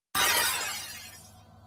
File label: Set Card; Anime & Manga